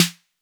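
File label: Roland 808 snare drum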